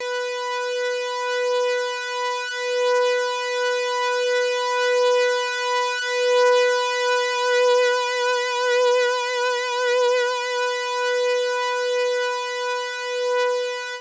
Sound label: synth; widdavirtual